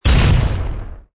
boom; pusoy

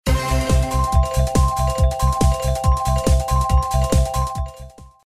Music Total Big Win

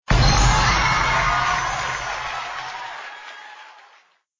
big win start

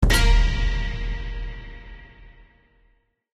scatter fly end

